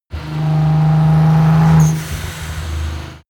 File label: car nissan gt-r 35 acceleration short gear